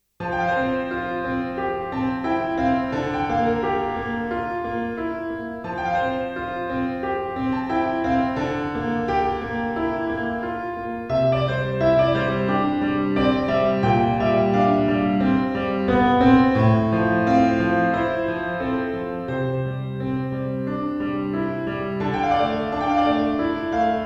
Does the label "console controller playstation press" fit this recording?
No